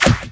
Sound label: Attack; Superbrawl Universal Attack Sfx; Nickelodeon Super Brawl Universe; Android